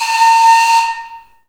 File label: flutes, Heart of Africa; Spectrasonics; Heart of Africa 1